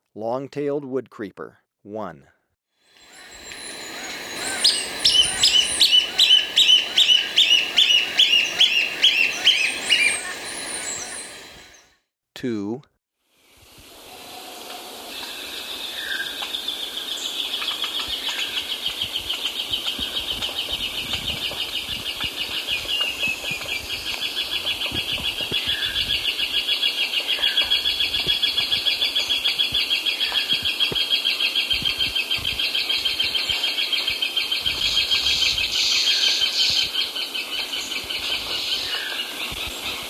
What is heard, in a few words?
Long-tailed Woodcreeper; S. America